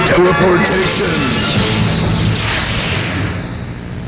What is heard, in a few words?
teleport